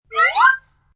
Rising sound made by a slide whistle, good for cartoons; good for cartoons and animation